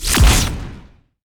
heroes black Aegis explosion; therien

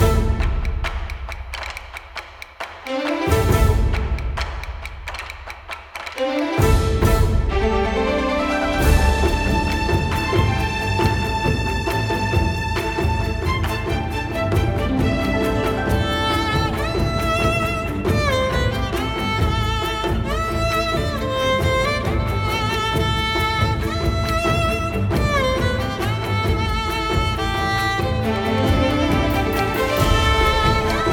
bgmusic battle

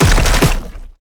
heroes creeping Death spikes; grimson

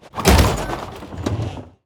bastion shot

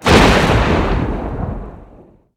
lightning

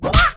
Hero Monk Multihit scream

